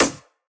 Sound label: Snare, E; Drums; One Shots; Humble Ingredient Snare; Cymatics - Humble Hip Hop Sample Pack